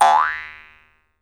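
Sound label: Jaw Harps, Pitched Percussion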